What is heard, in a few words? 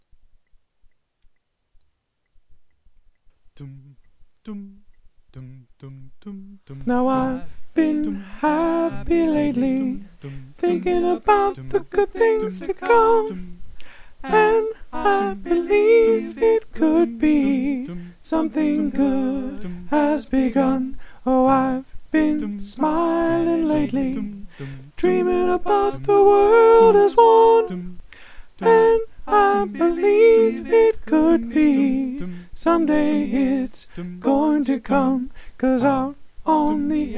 soprano